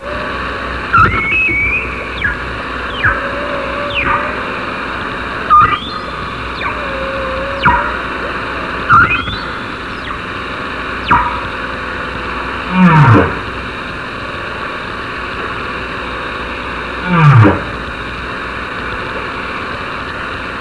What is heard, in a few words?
Humpback, Ocean Noise